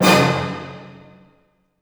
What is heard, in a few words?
C DIM FF, orchestra hits; Sound On Sound - Peter Siedleczek's Orchestra